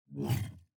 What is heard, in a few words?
upgrade charge